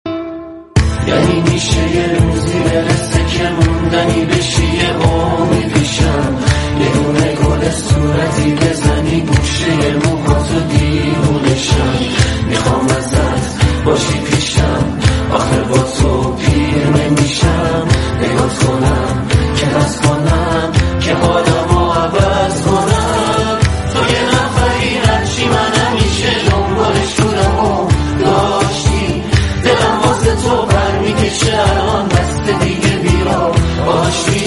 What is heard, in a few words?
Guitar